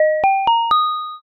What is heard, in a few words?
asia xylo